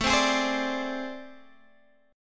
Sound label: A Minor Major 7th Double Flat 5th A, Guitar, Drop D